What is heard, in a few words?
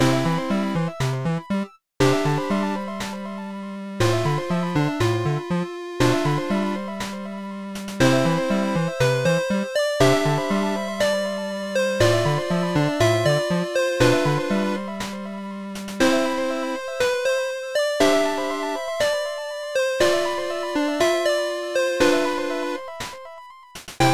theme plain; NinjaAdventure; Godot